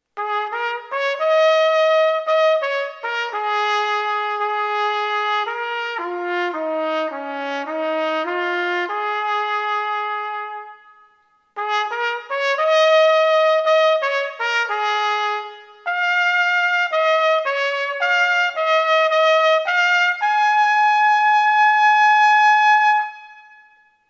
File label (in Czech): fanfara